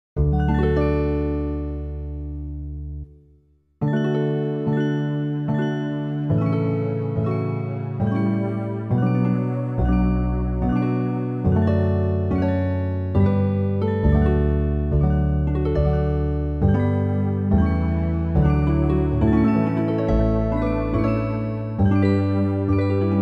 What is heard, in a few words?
karaoke low; ombra mai fu; handel